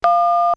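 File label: PHONE TONE; Microsoft Office 95 Professional; VALUPACK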